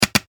Double Click; multimedia

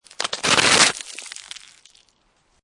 Snow And Ice Hard Snow Or Ice Scrape Debris; Ice Sound Effects; Ice (Sound Effects:Weather)

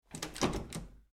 Foley Door Handle Shake; Miscellaneous Doors

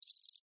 Cricket Chirp, Single, Insect; Animal; Bug; Crickets

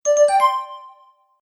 Magical Message Notification

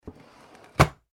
household drawer wooden shut; Wooden Drawer Closing; Drawers; McKinney Sound

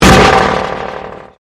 Convolution Pulse

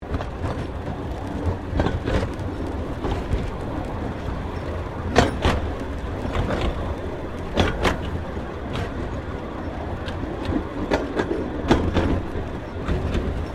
Train Steam Locamotive Kraus 0-2-0 Rollingstock; 1906

